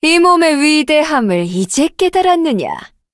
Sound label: Cookie levelup; cookie run